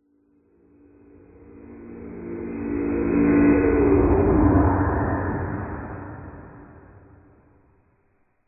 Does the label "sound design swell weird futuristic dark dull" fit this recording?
Yes